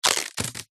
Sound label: office sticky tape remove peel